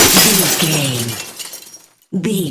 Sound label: glass smashable large break